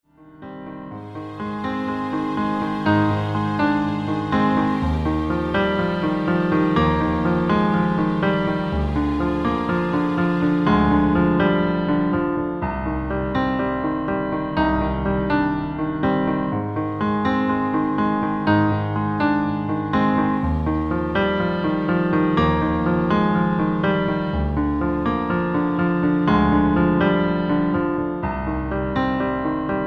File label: Strings; Eb; Streicher; Instrumental